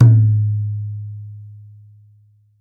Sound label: khol gha